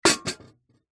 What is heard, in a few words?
teapot metal old set down on hard surface; household; zapsplat